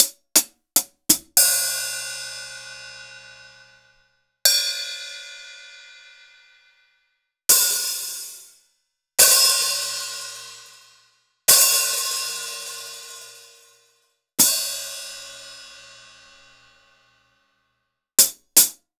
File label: Akai MPC500 Hats